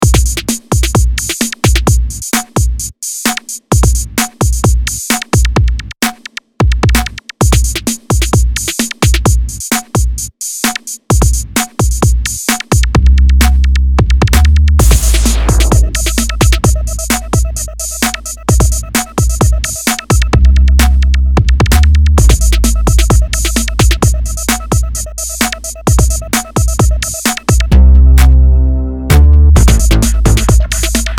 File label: Instrumental